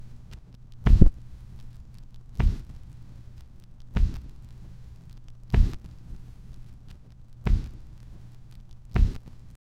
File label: a hype majestic sound of, euphoria